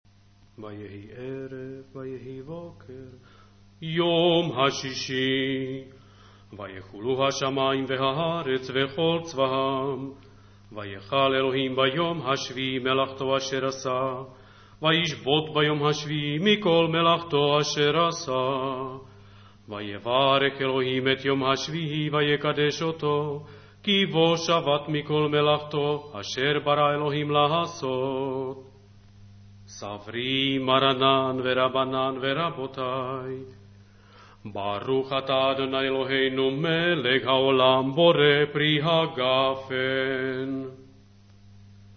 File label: kiddush, mono